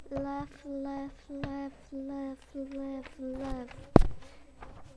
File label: laugh